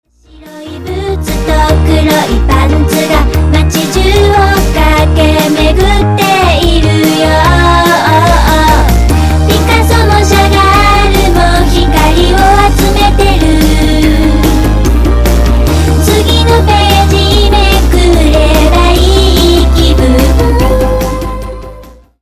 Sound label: castanets